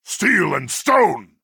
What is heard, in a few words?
attack; gortwog gro-nagorm